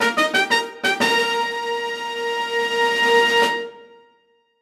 Race Start Fanfare; Fortune Street; wii

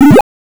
Coin; Pac-Land; TurboGrafx-16

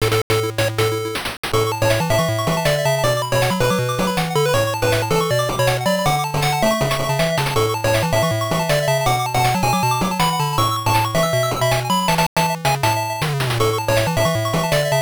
Game Victory

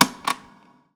lightswitch

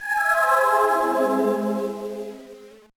Failed, gba

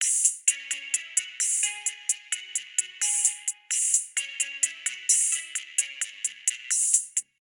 Elevator Music; Jydge

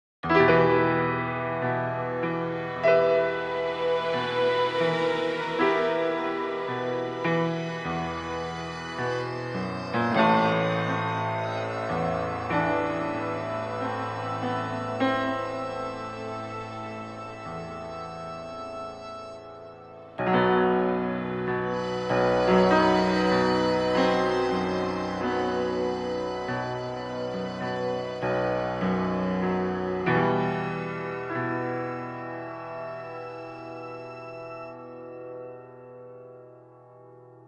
ghost piano; Railway Empire

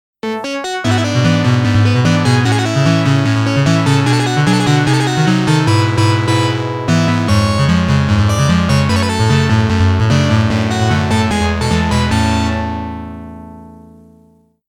Clear BGM; Gun Smoke; Capcom Music Collection Vol.1

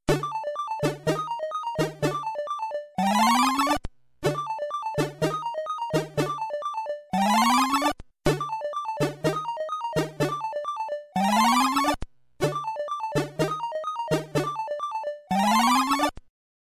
Coffee Break - Cinematic (C64); Pac-Mania

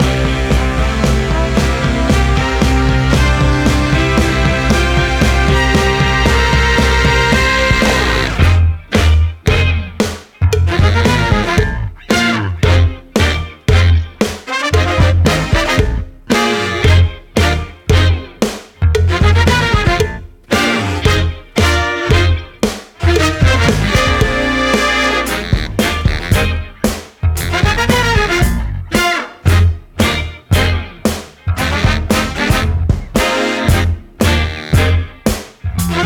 80s Brass Pop

